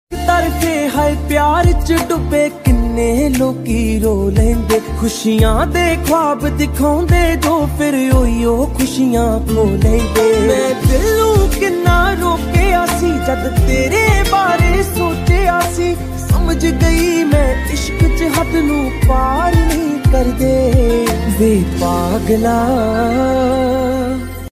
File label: Punjabi Ringtone